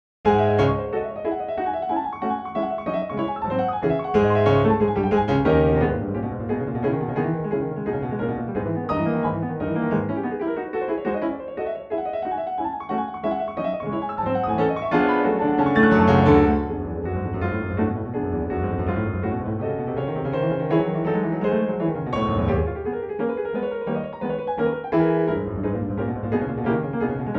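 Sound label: Chopin Etude Opus 10 No4, Pianoteq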